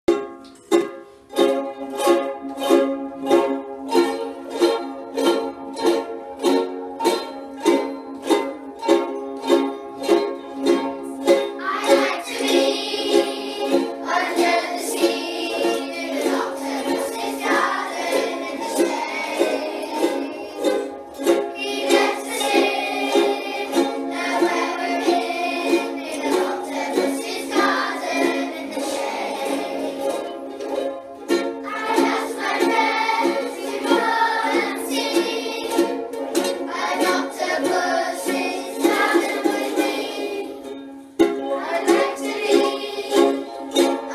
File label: Ukelele